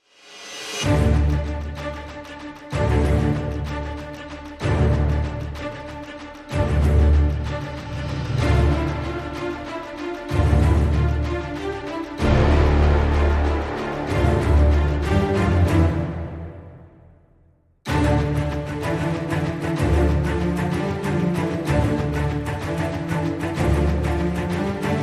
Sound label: Full With Small Perc